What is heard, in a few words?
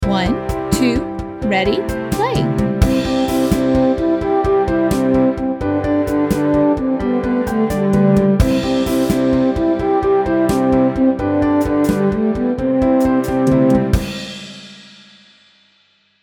WITH INTRO, ukulele; Rainbow Ukulele